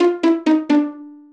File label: jingles pizzicato; Music Jingles